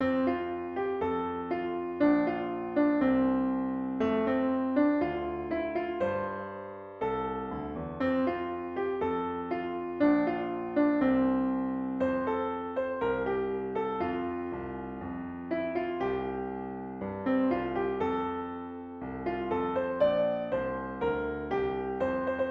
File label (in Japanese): ピクニック（ピアノ）